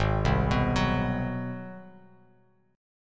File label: G sharp 7th Sharp 9th, G#7#9 chord, Bass Standard; arpeggiated; 4,3,9,11; JGuitar